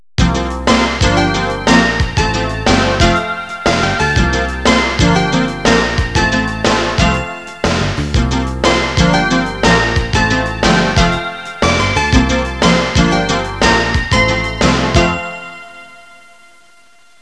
suonerie businessy

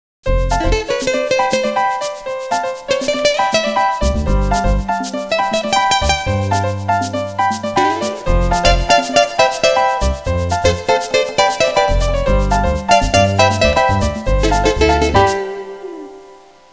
suonerie musica jazz

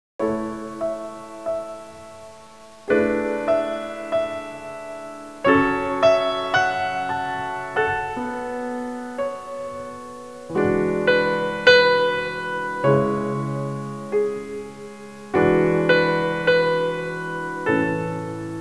suonerie classica; musica classical

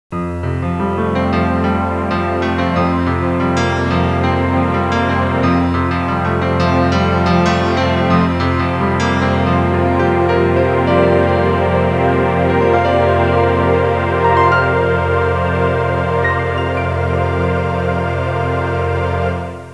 audio suonerie musica mistica

